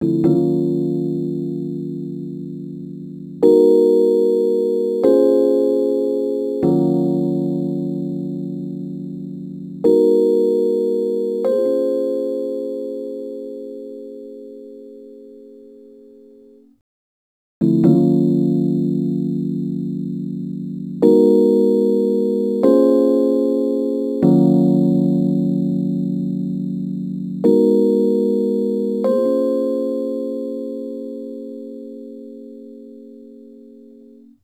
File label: Rhodes Attacks; Ueberschall